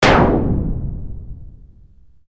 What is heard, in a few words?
forge